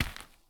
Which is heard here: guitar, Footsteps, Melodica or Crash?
Footsteps